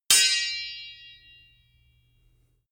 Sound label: sword hit sweetener